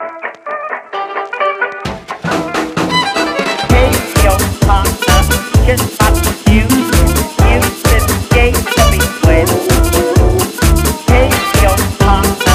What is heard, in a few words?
hipster swing